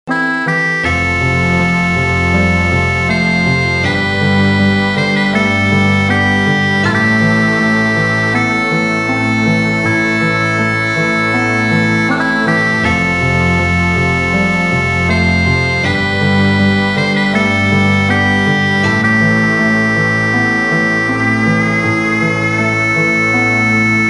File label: Ringtone; Braveheart